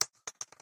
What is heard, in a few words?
Shell Shotgun Bounce; gamerip